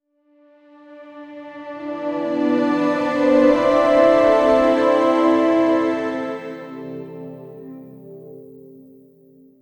hopeful cinematic pad bir, orchestral

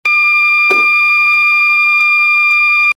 alarm sound; startrek-like

